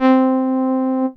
Yamaha PS-55 Trombone C4; Synthesizer - Brass

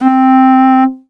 Casio VZ-10M Clarinet C4

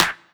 M-Audio Venom Clap

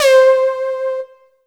Alesis S4 Plus Chiff Lead C5

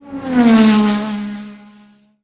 Race Car

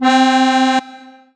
Roland SC 88 Accordion C4

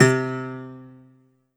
Korg M1 Santur C3

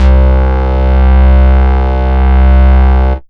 Yamaha V50 Synbass C2

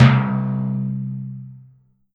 Ensoniq ZR-76 Tympani High

